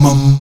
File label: VOX MUM C#3, Afro Chants; Roland LCDP11 Africa VOL-1; Stacc Mums